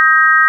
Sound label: sine; 音の波形と分析